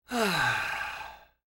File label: sigh